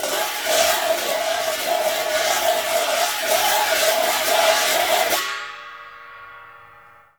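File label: CHINA, cymbal; Spectrasonics; Heart of Asia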